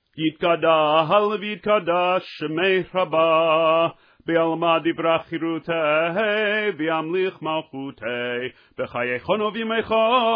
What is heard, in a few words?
kaddish before musaf upbeat